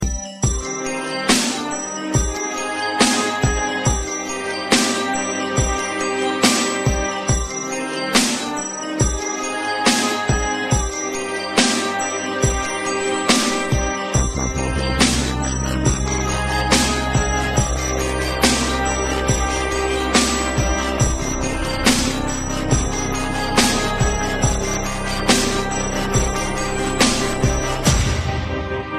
dubstep remix; batman

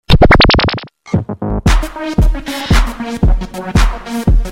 dance message

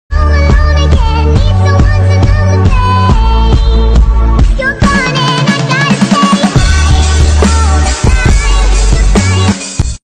Drummer Girl

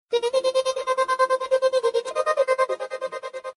electronic echo

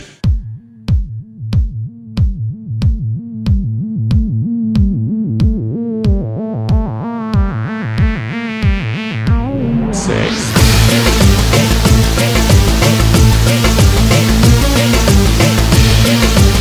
dance song

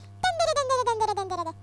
funny mouse

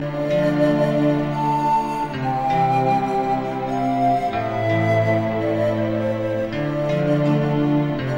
japanese flute

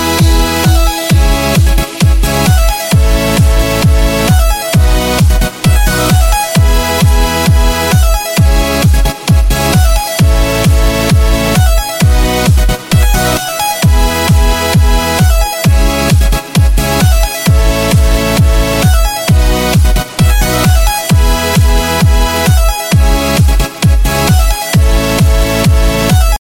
electronic theme

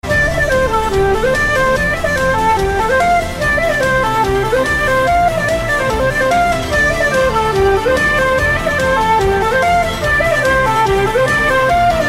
flute; fairy tail

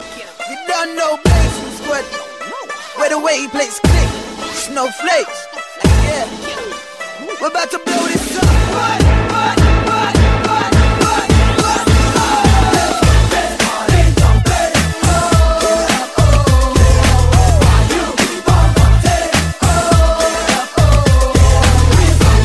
dance hit

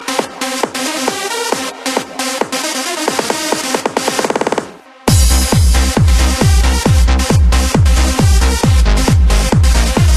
electronic

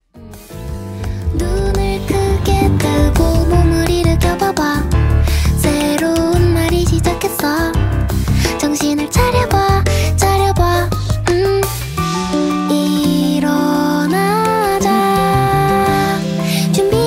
Dreamy Alarm

